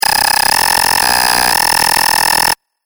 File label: Glitch Cartoon Broken Timer; Glitch Sound Effects